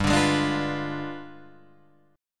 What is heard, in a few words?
G 7th Flat 9th G, Guitar Drop D